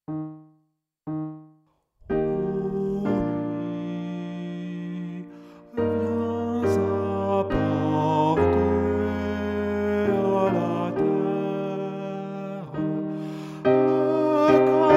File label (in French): soprane